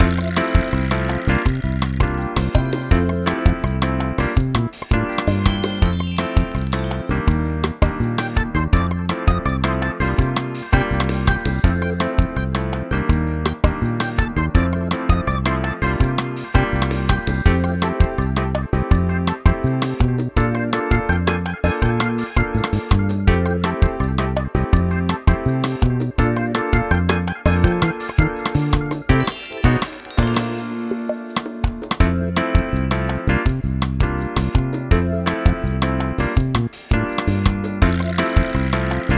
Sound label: BGM Shop